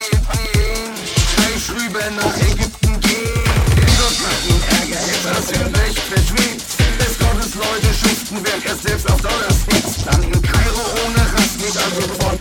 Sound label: dubstep remix